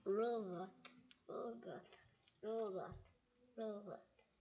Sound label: robot